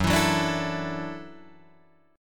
F sharp Minor 7th Sharp 5th F sharp, Guitar Drop D